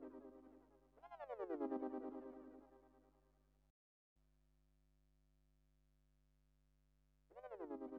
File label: Trance